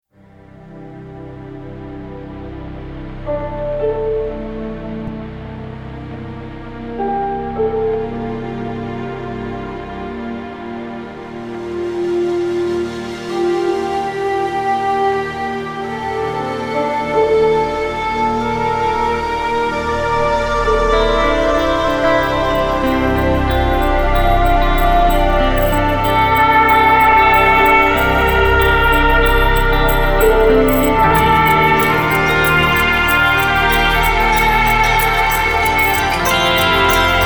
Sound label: Epic Modern Orchestral